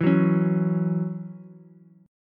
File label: Db Minor Double Flat 5th Db Ukulele; strummed; Dbmbb5; JGuitar